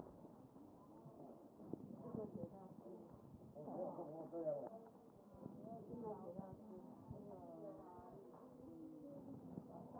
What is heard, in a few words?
A CHEST